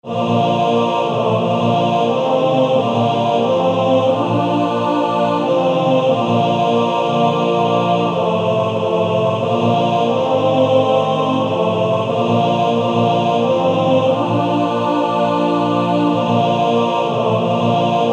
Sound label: Male Choir TTBB